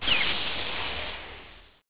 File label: door open